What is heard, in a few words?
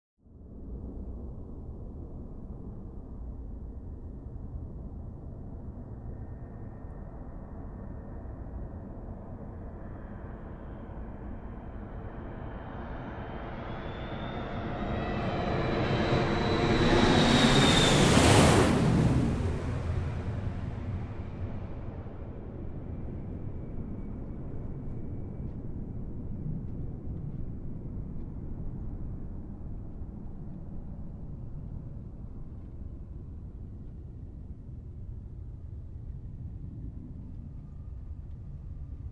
Airplane on a runway taking off